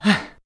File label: Refreshed; Link